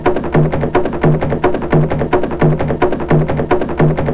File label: Native Drums; Lottery Wizard 2.0